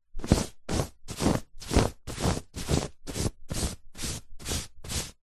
household bedsheet movement fast rubs hand flatten; zapsplat